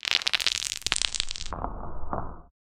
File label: satisfying weakspot enemy, combat